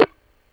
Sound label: CLICK; East West - Global Sounds; VOCALPHON